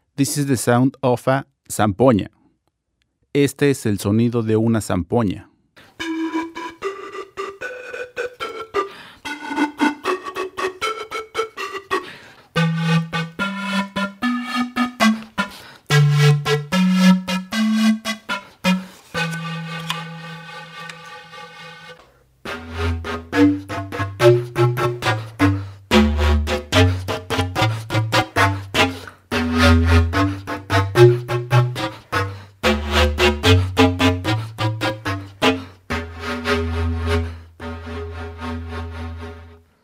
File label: Peruvian Music ZAMPONIA PANFLUTE